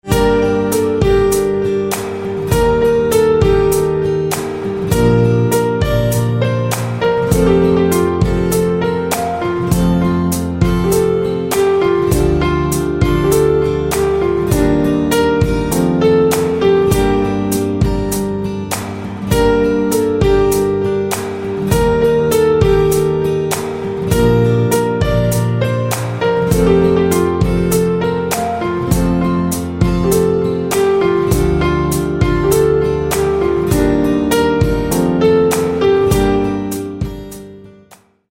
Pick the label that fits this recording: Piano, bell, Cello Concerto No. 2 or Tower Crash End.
Piano